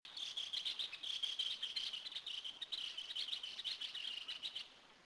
tyrannus caudifasciatus jamaicensis windsor jamaica; West Indian